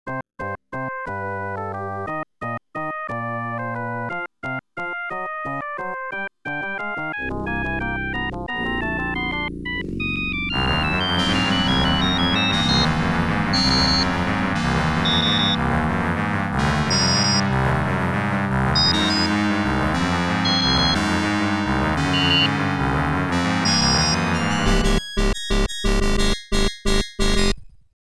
OPL3

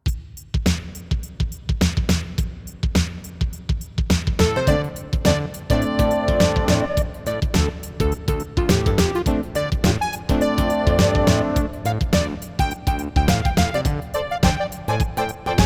CM32L

